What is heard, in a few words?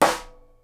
SNARE, Live Snares